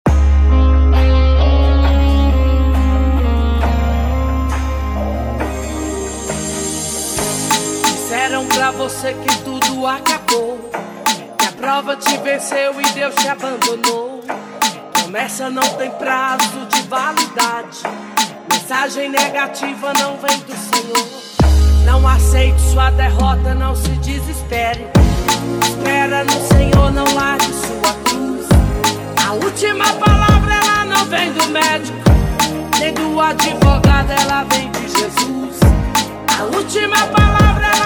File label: remix gospel